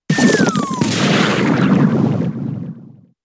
Acid Spray; Pokémon SFX Gen 7 Attack Moves; SUMO USUM

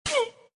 Pain hit guy

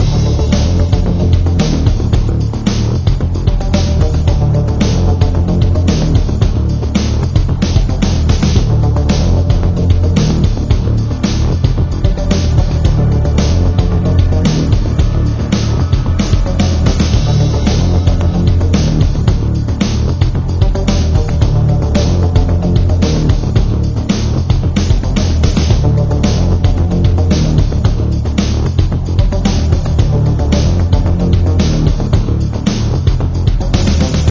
Tension Loop; Last Hope Tower Defense; Scorched Land

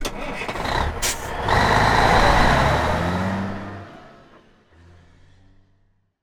escape truck; teardown; ps5 windows xbox series xs

